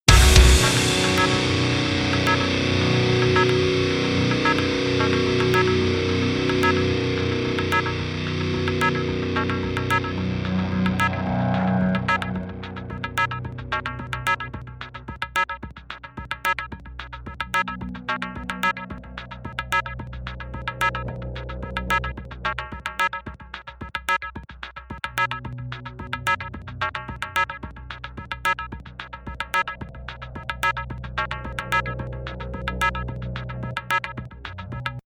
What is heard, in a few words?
start round, music